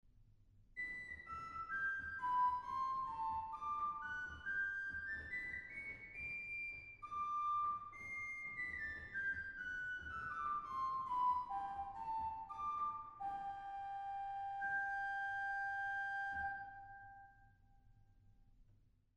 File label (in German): Nachthorn 1, organ; stade